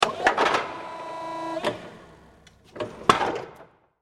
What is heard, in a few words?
Industry Hi Lo Motor Operate; Motors; Machines; SFX Bible